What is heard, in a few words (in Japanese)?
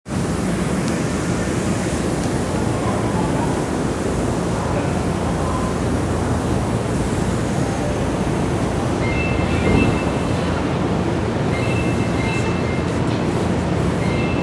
小田急相模大野西接近